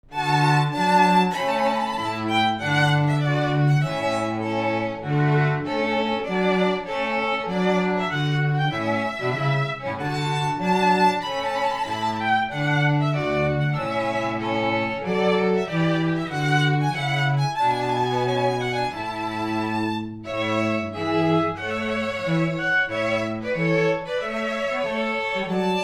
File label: trumpet tune; Purcell